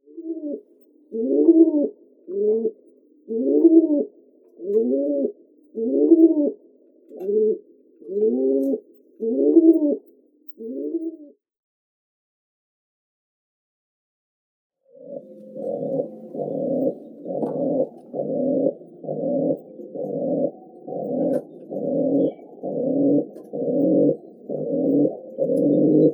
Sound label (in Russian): golub sizii columba livia